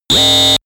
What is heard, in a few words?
system overload; digital